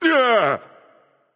surprised; smite; tyr

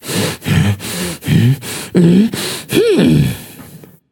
breath, voicepack; smite; jorm

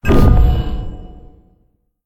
fire; smite; atlas skin3

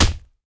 fire impact; hades; smite

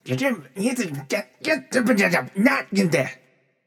grumble; smite; janus

